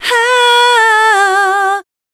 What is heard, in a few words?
VOC F, Dance Vocals, FEMALE